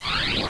Open Window

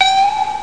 The Ping

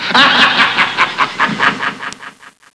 Laughing Warning Beep

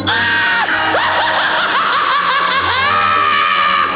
Laugh

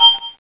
Metal Beep

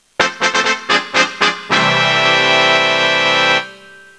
Trumpet

